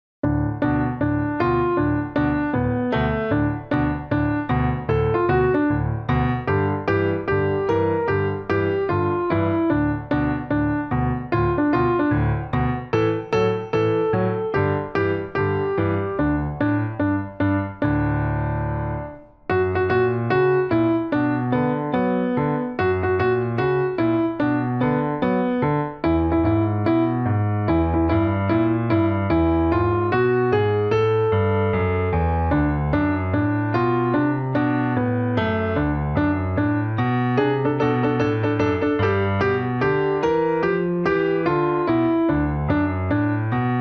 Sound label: Honky tonky piano; blues boogie